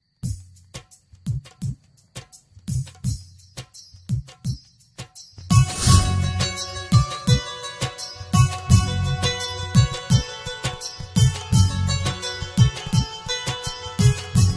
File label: movie bgm love bgm ringtone love ringtone trending ringtone; kushi